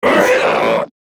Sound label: Pain Grineer Manic; Battle Barks